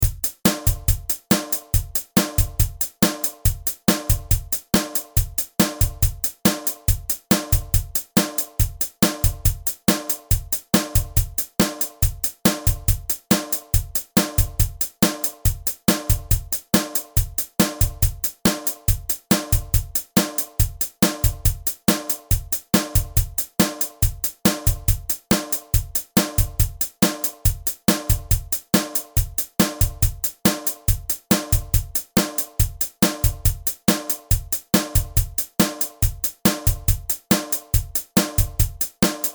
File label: Feeling Drum Track 140bpm